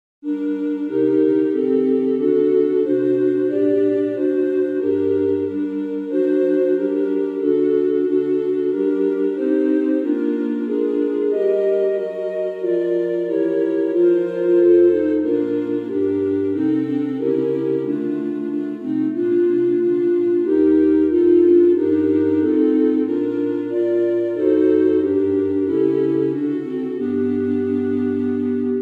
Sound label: Instrumental; Bach; St John Passion